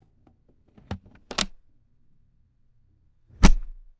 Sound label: plastic car ashtray opening and closing